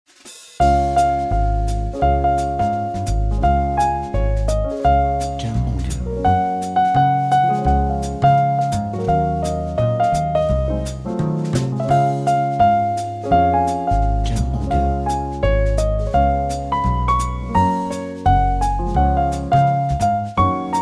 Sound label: Christmas Jingle Bells Swing Jazz